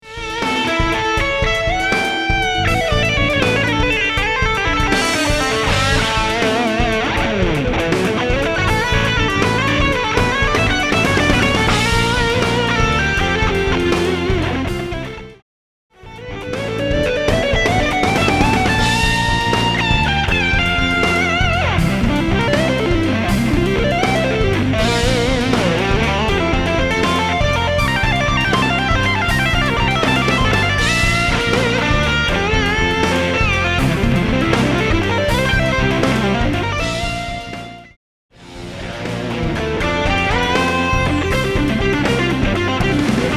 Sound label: Creative Intervallic Guitar Soloing